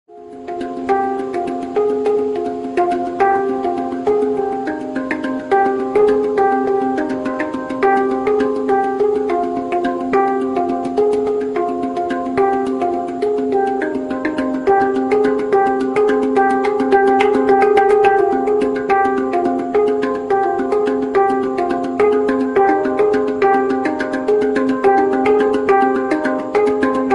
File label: hang drum ringtone